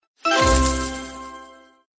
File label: line win